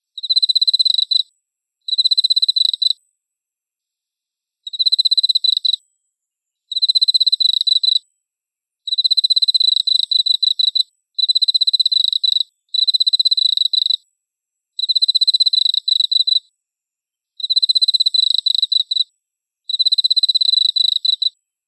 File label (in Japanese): enma koorogi